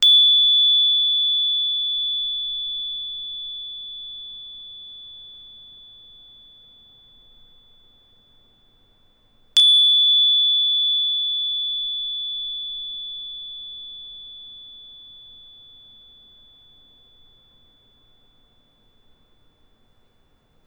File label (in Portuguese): Pin La, instrumentos de quartzo; escutar instrumentos de quartzo